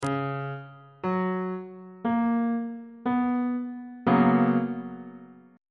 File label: a double sharp major 7th suspended 2nd 1st inversion on bass clef